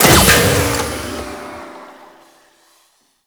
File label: Ogris Shot, weapons